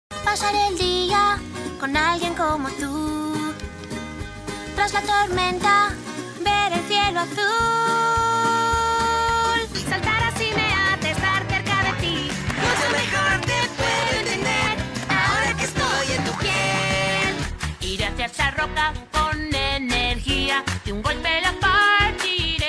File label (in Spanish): trabajos cantados